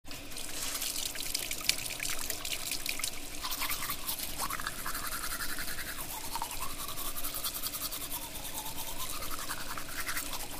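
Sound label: toothbrush sound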